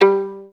VIS PIZ G2; Best Service; Peter Siedlaczek's Advanced Orchestra

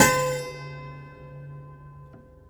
Prepared Piano; Battery 3 Sample Kits

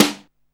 Snare, Alesis Hr16, Drums

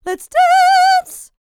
LICKS, Vocal R&B Dance; LT DANCE

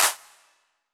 CLAP, Drums; Yamaha SY85

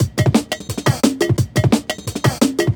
DNB BREAK

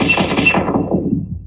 Processed Perc

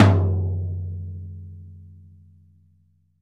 LTOM MP; VELOCITY TOM 2

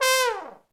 THE TROMBONE

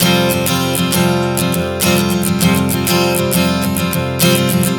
Strum 100 F9, One Chord Strumming 100 bpm; Acoustic Guitar

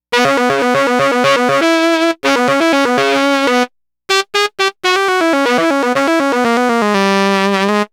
Sax Line; Dr. Fink's Funk Factory

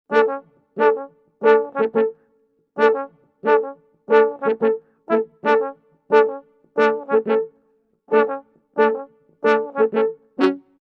90 f#min brasses, Orchestral arrangements

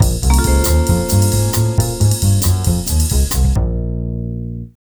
TV Suspense Full A#, Lounge 135; Zero-G Kocktail Kollection; Construction Kitz (Lounge); TV Suspense Mixes 135

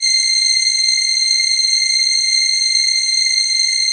HIGH STR, House Dance; 90 s Sample CDs; Best Service Dance Mega

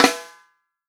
REAL DRUMS FUNK KIT

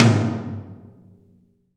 TOM TOM, Roland

